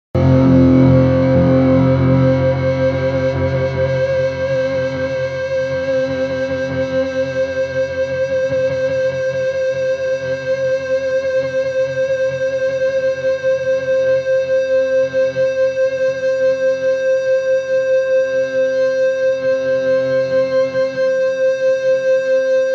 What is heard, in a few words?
Feedback Intro